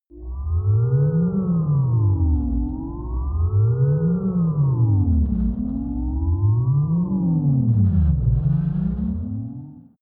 broken ufo

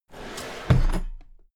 drawer cabinet equipment close heavy

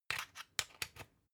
usb port metallic in

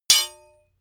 hammer hit metal hard

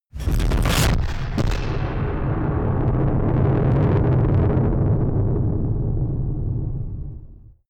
distorted explosions

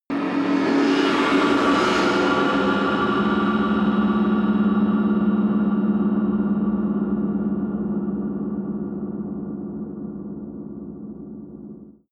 whoosh scifi echo

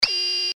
alarm

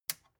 metal button release power on

